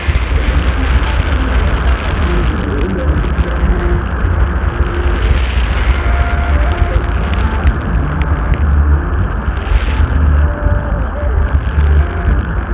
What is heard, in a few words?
am bc